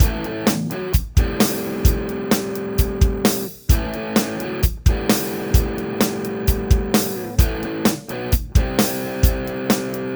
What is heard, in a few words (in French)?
bridge distorded, guitare SG; gibson; sg goddess ice burst